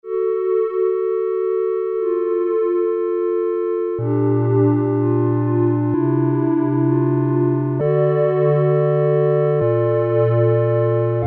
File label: Square Wave Ensemble, Korg Poly-800